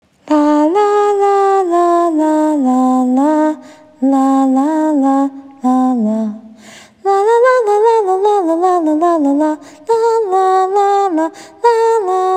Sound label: Lalalala